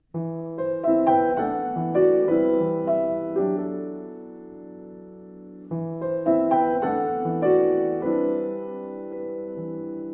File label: Solo Piano; 30sec